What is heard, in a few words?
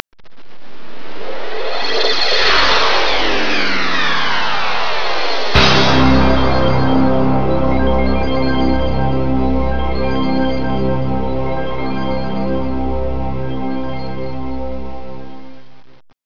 Robotic Bang; ClipMedia™ Demo